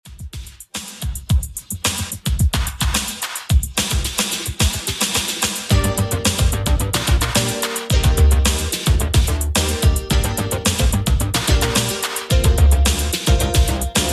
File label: 80s Redrum 109 bpm